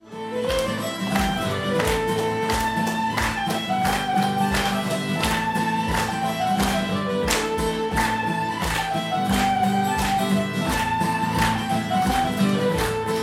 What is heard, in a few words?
Polka; Ballydesmond